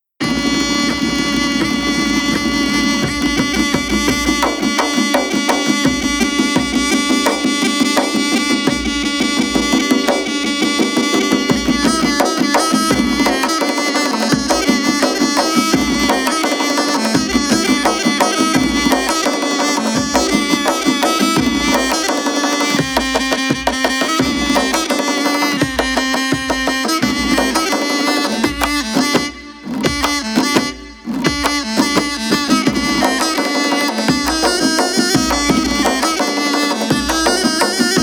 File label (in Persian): Heway Shernaxewe, گروه تنبک نوازان